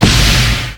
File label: SFX attack